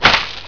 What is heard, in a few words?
bump; quake2; ontra